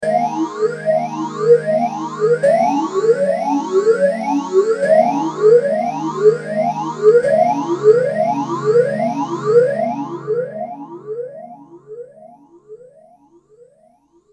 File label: m1000 boss ph3